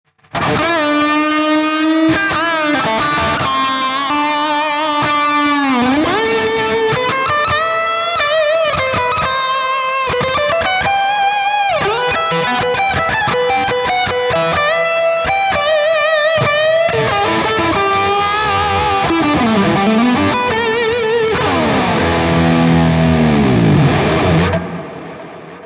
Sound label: Juicy Lead; Neural DSP Archetype Rabea